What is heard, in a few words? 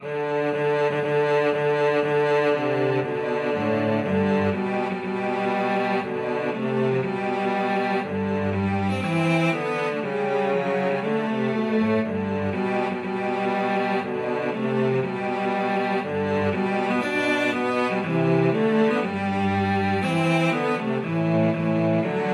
wedding march, cello duet